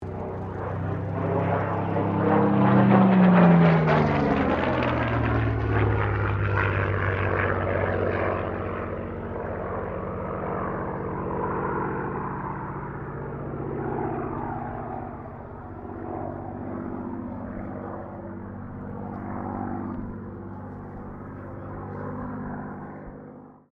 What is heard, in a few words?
AIRCRAFT SUPERMARINE SPITFIRE MK-XVI PASS